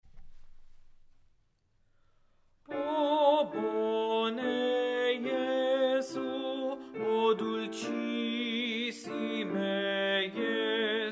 O Bone Jesu Baritone; O Bone Jesu, M. Grancini; Choral Resources

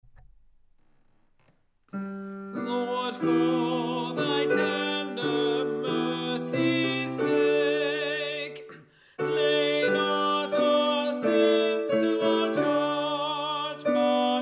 SLOW Tenor; Lord, For Thy Tender Mercy’s Sake-Slow, D. Farrant; LENT; Choral Resources

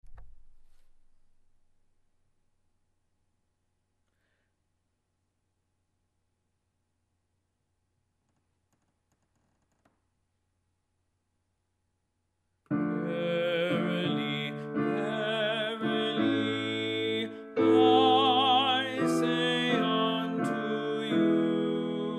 Verily Verily Tenor; Verily, Verily, I Say Unto You, T. Tallis; LENT; Choral Resources

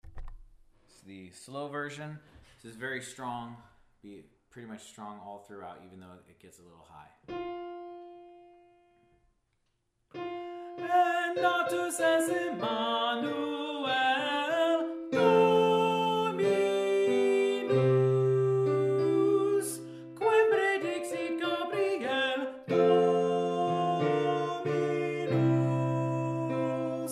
Alto SLOW; En Natus Est Emmanuel-Slow, M. Praetorius

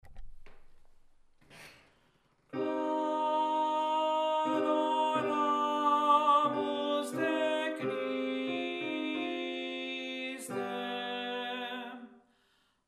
Tenor Alto; Adoramus Te, Christe, T. Dubois; Choral Resources

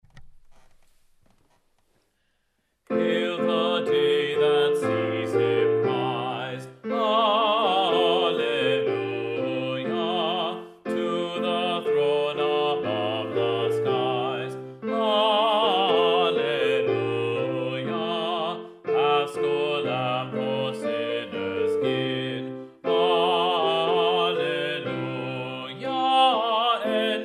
Hail the Day Bass; Hail the Day that Sees Him Rise

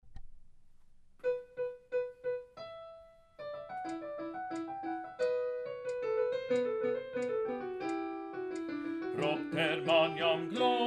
FAST Bass; Propter Magnam-Slow; Choral Resources

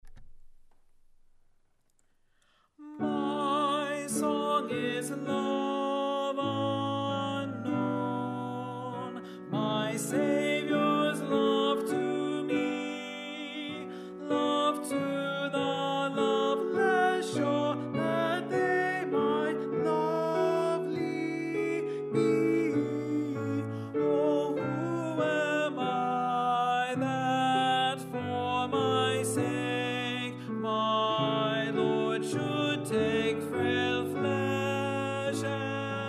My Song Is Love Alto; My Song is Love Unknown

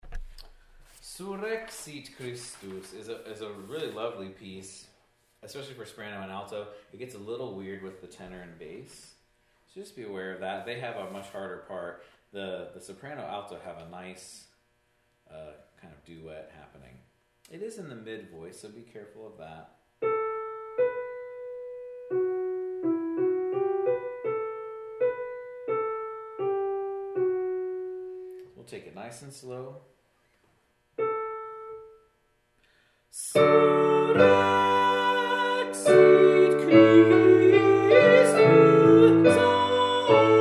Surrexit Christe Alto; Surrexit Christus Hodie, A. Vierendeels; Choral Resources

